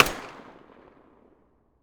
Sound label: mp5 muzzle mono far, weapons